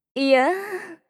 いやーん

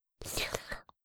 べろん, ASMR

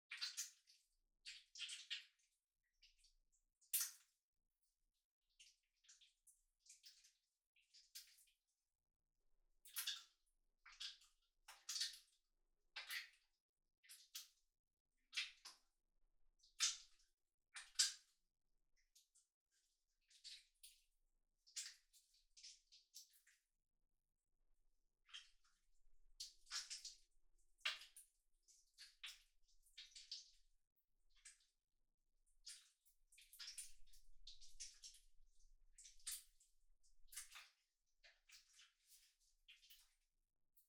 そのほかのクチュ音; 3DIO; アダルトな音

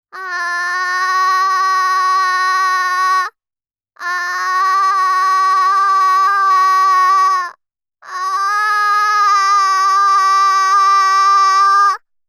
あーーーーーーー

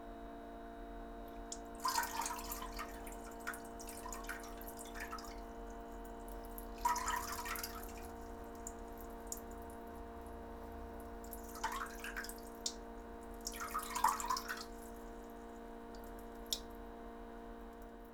しょろしょろ長めおしっこ（声なし）

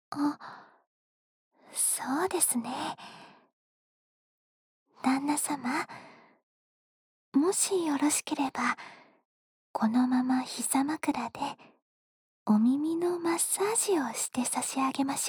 お耳のマッサージ, ASMR; お耳のマッサージで極上の癒しを

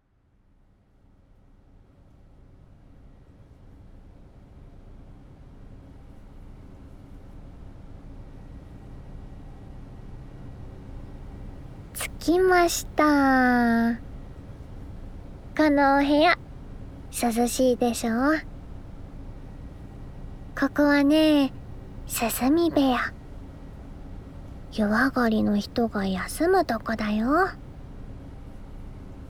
耳かき（左耳）（耳マッサージ＆耳かき）, ASMR; りいこ; 涼み部屋でりいこの耳かき（左耳）

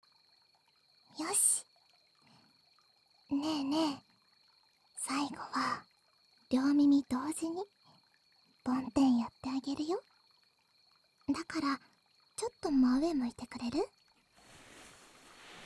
両耳ふわふわ梵天

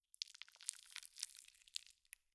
挿入音

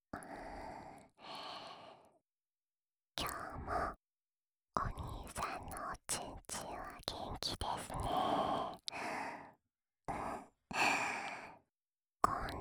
オナサポ用フェラチオループ, ASMR

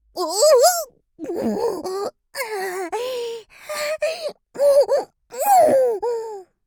おぉぉぉ… おほぉ はぁっ

